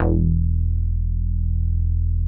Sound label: MINI, MOOG BASS